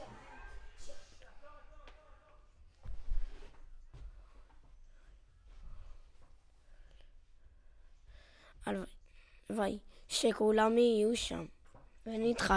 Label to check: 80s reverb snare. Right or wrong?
Wrong